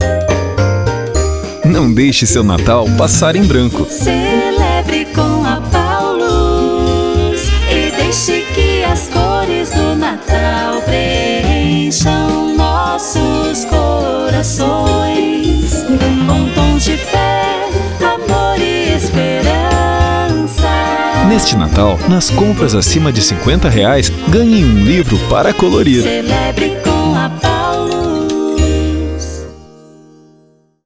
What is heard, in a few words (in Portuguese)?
jingle natal